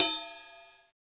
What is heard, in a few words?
ride cymbal sound B key, ride cymbal one shot tuned to the B note